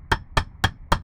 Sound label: crafting